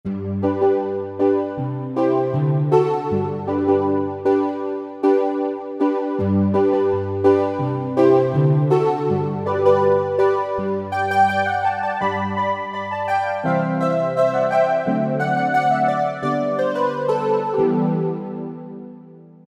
Nylon Syn, Generalmusic Equinox

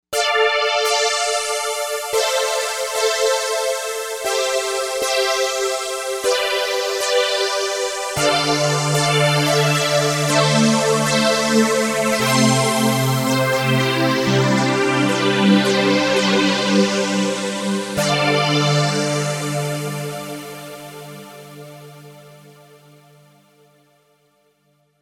Spectra Pad, Novation UltraNova; SuperNova Soundpack Volume 1

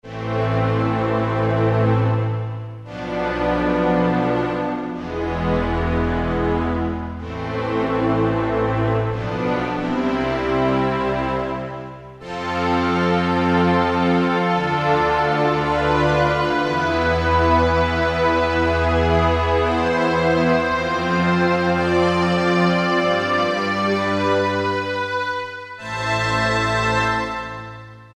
Combie Strings, Roland D-50; Factory preset demos

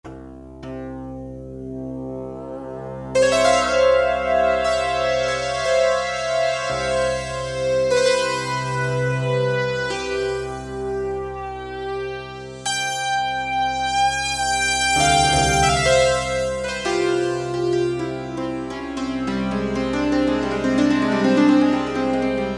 Sitar; Roland PN-D50-02

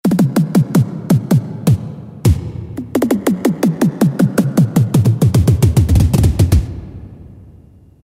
Elec Perc; Roland MT-32

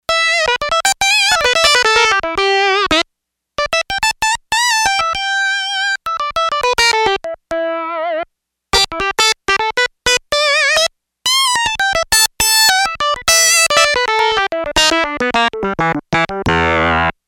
Yamaha TX802; Factory Patches; LeadaPicka; Voices

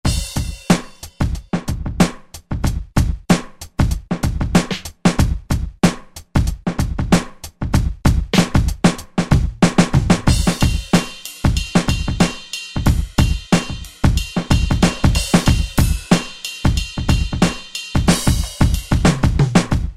street kit; Roland SRX-05 Supreme Dance

